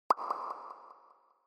Onoma Pop High Wet

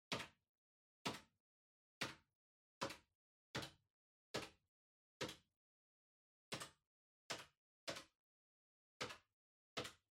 Calculator (Far Single Mid)

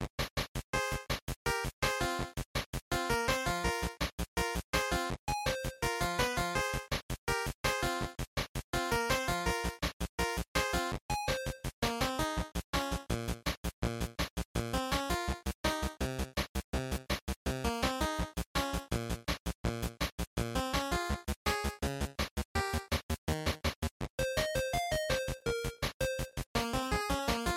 Fighting Match Loop 165

